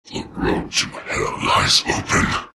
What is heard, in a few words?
shards death; terrorblade